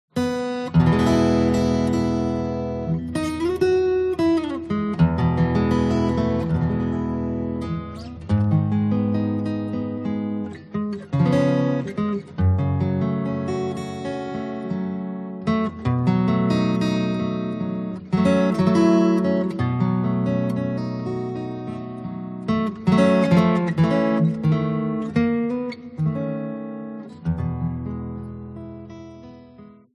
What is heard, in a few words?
guitar, source music; Venizia